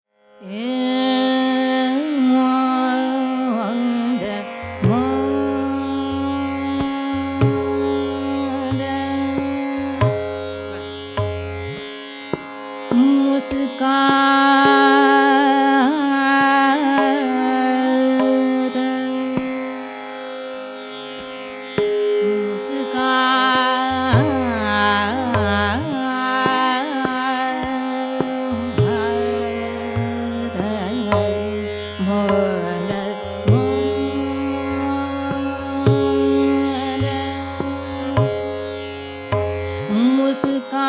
Pancham

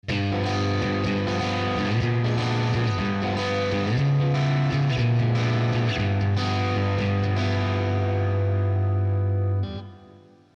8 Bar; ragajunglism

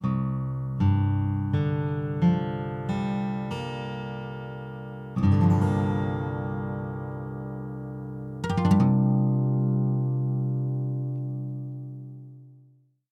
Tuning; ragajunglism

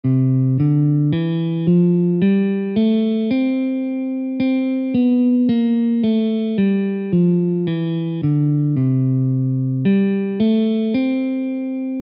scale; scales of India

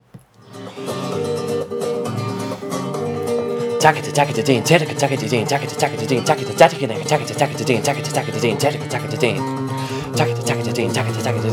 Konnakol rumba; flamen konnakol

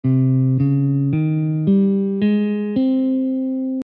balinese; global scales

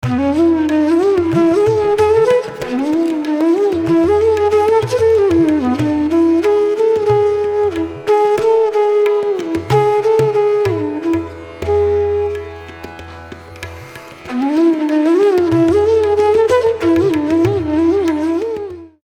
MATTA Jog

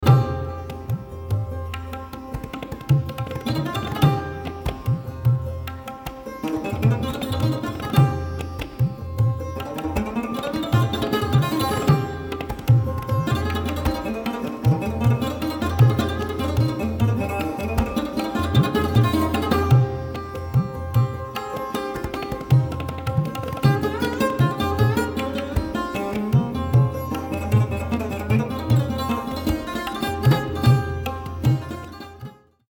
JHAPTAL Bhairavi

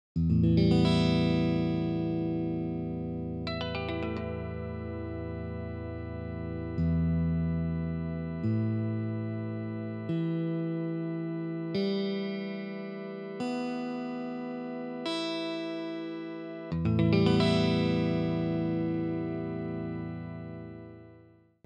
EAEGBE Sequence; crowdsourced tunings; ragajunglism

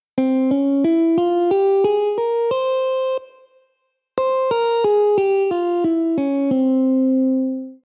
Phrygian Dominant; scales; ragajunglism

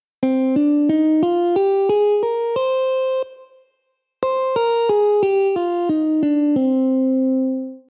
Aeolian; scales

